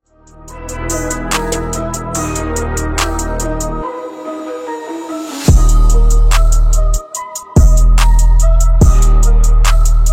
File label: background music